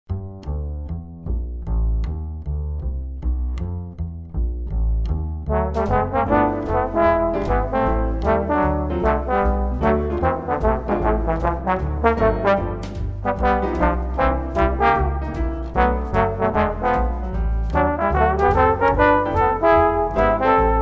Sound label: 2 TB Swing